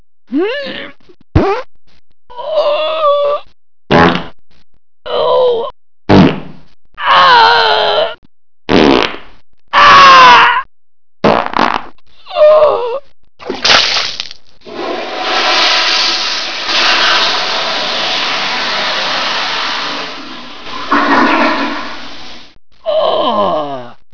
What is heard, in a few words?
fart wet one